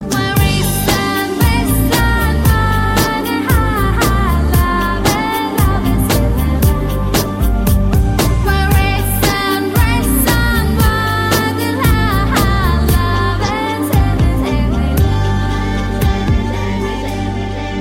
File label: Lovely Humming